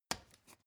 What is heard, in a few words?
step ladder wood single